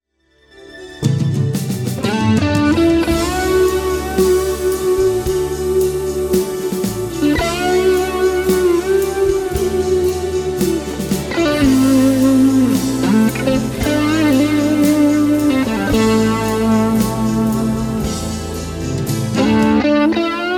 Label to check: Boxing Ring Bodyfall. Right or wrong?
Wrong